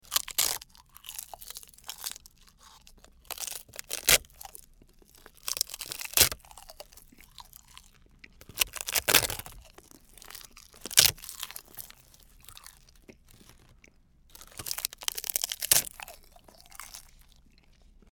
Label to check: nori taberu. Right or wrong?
Right